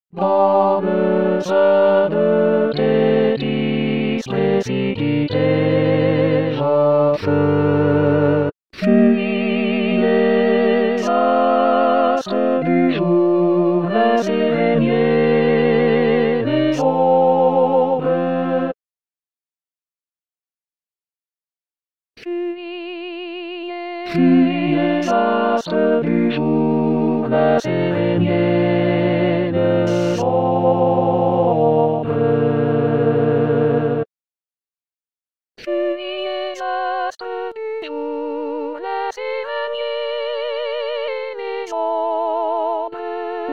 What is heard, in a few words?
tutti; sein thetis; 2022 st jean